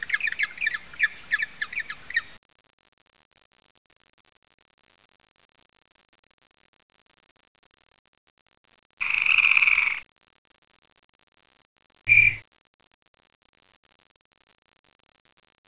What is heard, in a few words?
nature, ambience